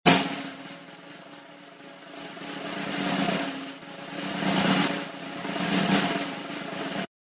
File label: drum snare drum roll with cymbal crash